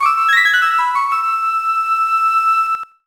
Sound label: Shougi Win; 1500 DS Spirits Vol. 02 Shougi; NDS